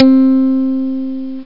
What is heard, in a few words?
stratus, guitar; OctaMED SoundStudio V1; jazzies samples